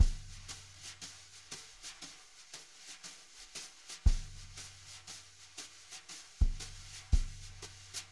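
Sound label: jazz brush, Drumscores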